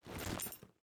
cloth; gmod; arccw uc commons attachments

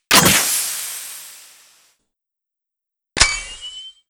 heavy; tfa starwars reworked shared ressources and assets

